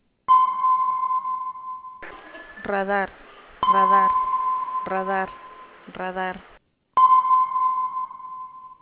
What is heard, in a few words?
Radar